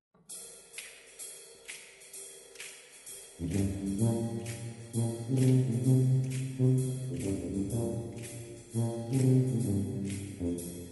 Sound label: Jazz